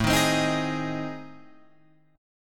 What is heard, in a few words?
A Minor Major 7th Flat 5th, Guitar Open F; strummed; AmM7b5; JGuitar